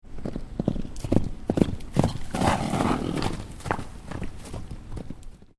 Horse Galloping From Right, approaching from right and coming to a stop; Horses; Horse Sound Effects